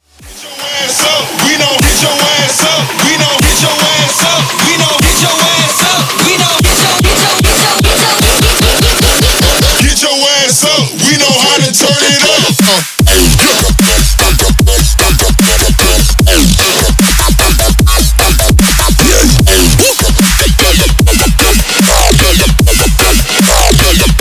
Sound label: Dubstep; Dubstep Project File